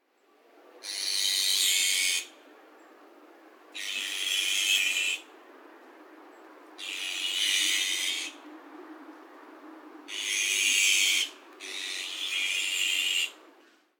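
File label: azure-winged magpie; onaga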